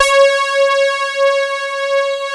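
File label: OBX PAD C5, Oberheim; USB Soundscan vol.49 - Analog Fantasies; AKAI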